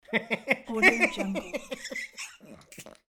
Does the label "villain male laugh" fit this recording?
Yes